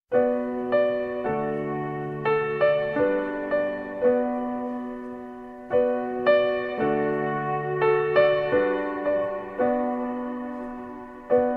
(Instrumental with Lyrics)

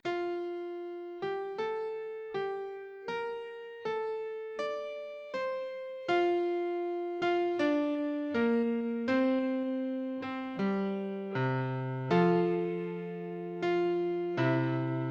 French Horn Emperor Acc; French Horn Solo

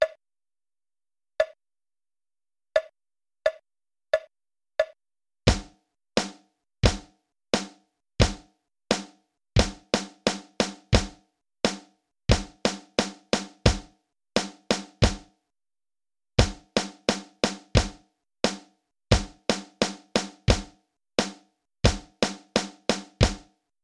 Scale Skill, drums

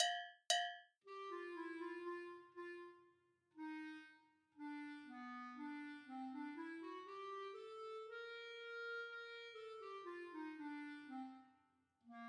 Clarinet Classical

